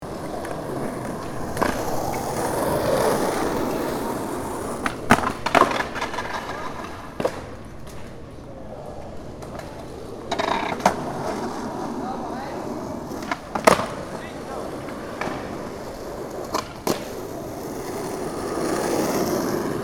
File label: Skate park children skating playground